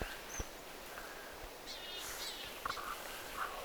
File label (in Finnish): homotiainen